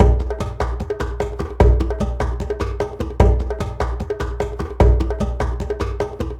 Tribal Ceremony, percussion; Sonic Foundry (Sony Creative Software); Joe Vitale Latin Percussion

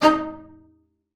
D#4, bass spicc; Xsample VOL-5

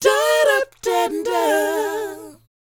DOWOP A#4; ILIO; Vocal Planet

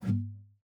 GUITAR FX; Xsample VOL-2

